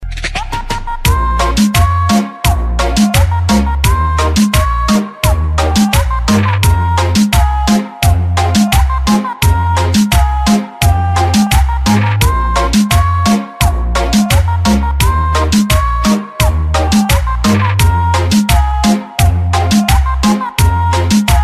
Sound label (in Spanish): musica romantica; latino